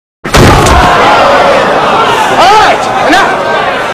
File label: crowd